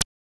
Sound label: Moog HH; Moog Modular55